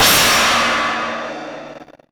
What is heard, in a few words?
crash L; Zero-G; Chemical Beats; CRASH ZOO